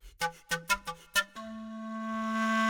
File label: Pan Flute, Hip Hop Exotica, 089 Amin; Big Fish Audio